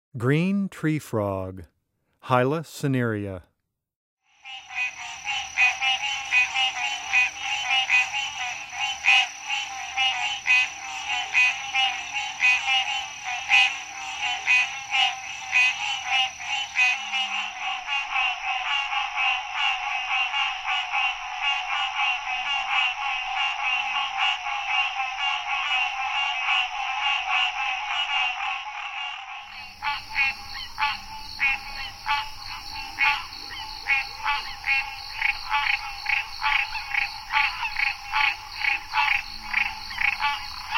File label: Green Treefrog Call